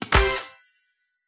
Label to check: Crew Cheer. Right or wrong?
Right